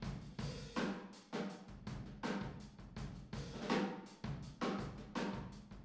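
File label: Boogaloo, Room Stereo, 82 BPM; Drums; Verse; Sonic Foundry; Drums from the Big Room The Mixes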